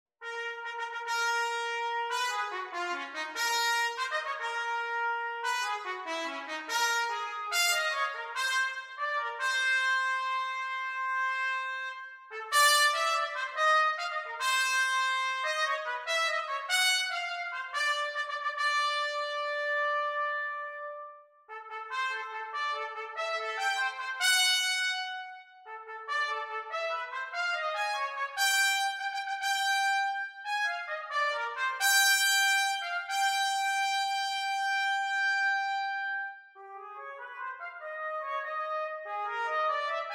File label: Fanfare